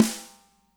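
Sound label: snare right; Spectrasonics; Burning Grooves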